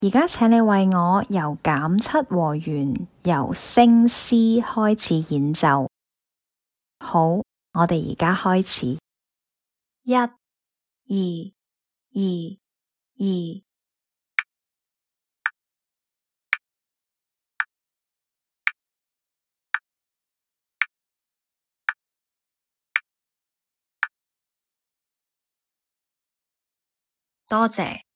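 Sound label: diminished 7th starting C sharp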